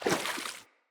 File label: Corrupted Battle Dog Walk Water Step, Foley Footsteps; Animals